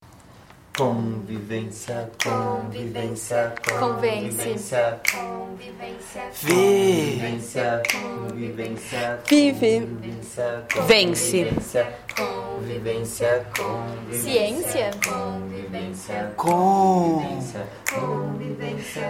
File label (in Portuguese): vinheta; convivência